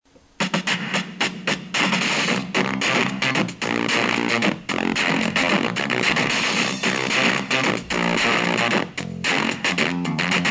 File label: Squelch